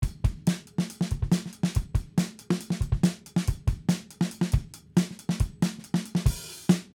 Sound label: Amen Break 139 BPM